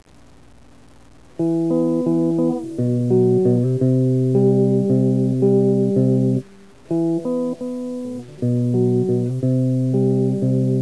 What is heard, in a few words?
guitar intro